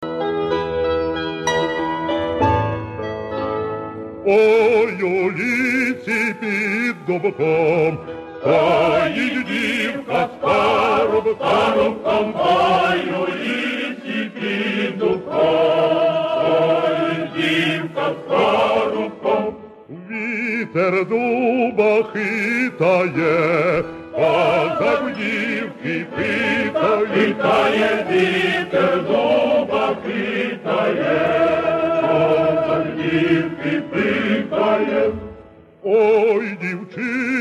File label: ukrainian bass